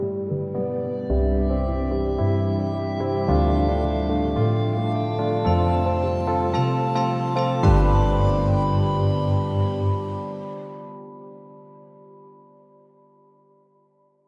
Happy Jingle Soft

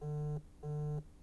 Phone Notification On Vibrate

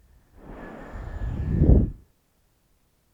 Woosh Muffled

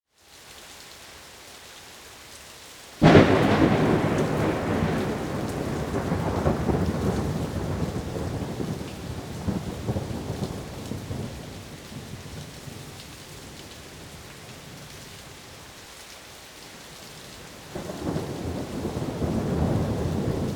Rain With A Thunder Storm

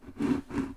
Move Bucket

